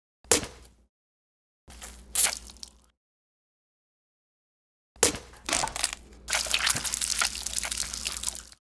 dao dam xuyen qua nguoi

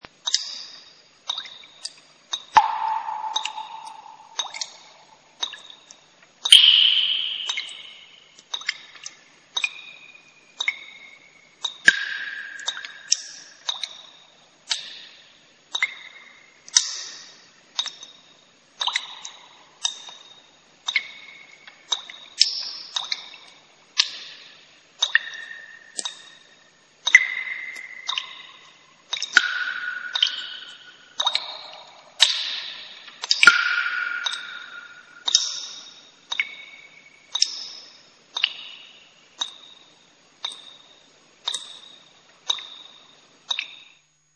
Tieng nuoc nho giot roi trong hang dong

